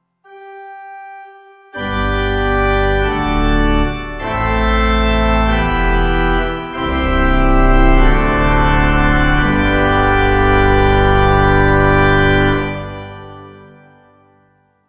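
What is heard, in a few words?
Benediction, Pipe Organ; Morning Service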